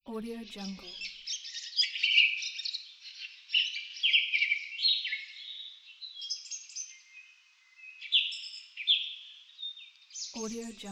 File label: the bird chirp